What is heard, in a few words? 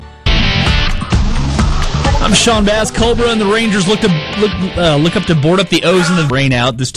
bass